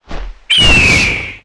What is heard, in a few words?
Watchman Attack